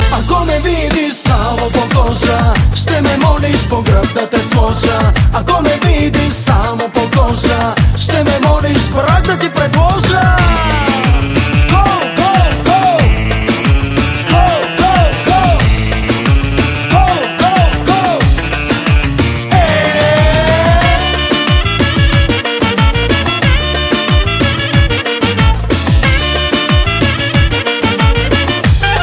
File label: Folk